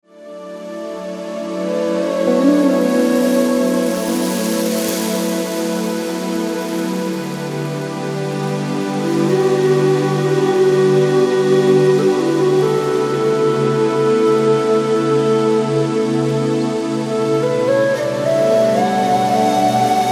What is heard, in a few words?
seascape instrumental